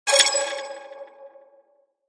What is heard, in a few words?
unlock new item game notification; mixkit